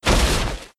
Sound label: knockdown; Power Rangers vs Teenage Mutant Ninja Turtles - Ultimate Hero Clash 2